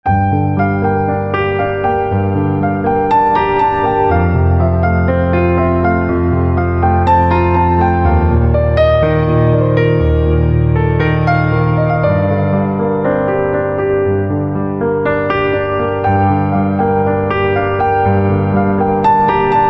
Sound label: Ringtone Piano